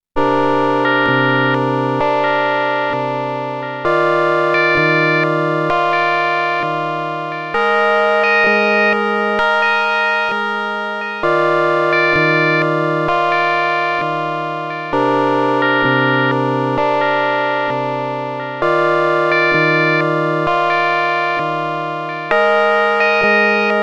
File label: Dreadbox Polyend Medusa